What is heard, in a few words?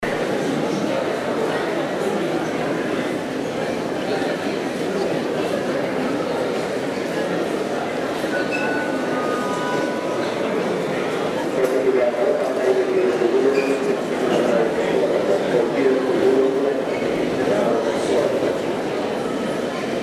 airport atmo with announcements